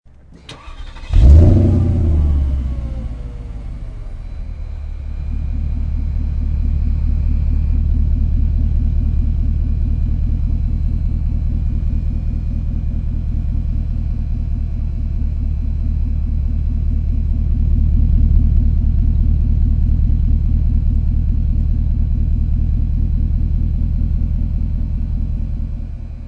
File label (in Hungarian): indítás alapjárat, porsche cayenne; Motorindítás, alapjárat